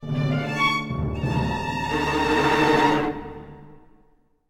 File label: Sting; Courtyard